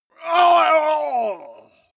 male groan painful

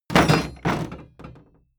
wood impact rattle

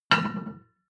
plate on plate place medium, restaurant cafe

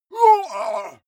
male fight moan painful; soundpool

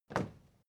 step crowd march quiet single left; schritte

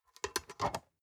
storage lid slide close soft, box; objekte

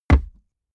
step walk wood plank; schritte

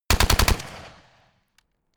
rifle ak-47 exterior fire burst

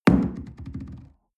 coffee place tablecloth hard wobble

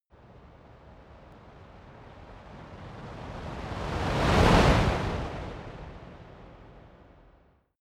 fire torch bright slow long flutter whoosh; swooshes-swishes

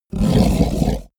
fishman snort; kreaturen

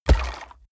coloss step on bones

